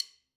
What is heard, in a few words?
stick click; apulSoft apTrigga2; Multi-Velocity Cymbal Samples